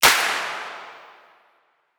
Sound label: Hard Stadium Clap; House; Drum One Shots; Claps; Cymatics